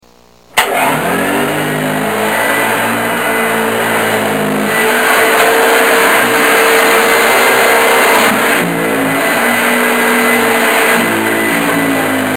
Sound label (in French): Aspirateur